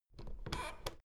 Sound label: door plastic handle pull down creak soft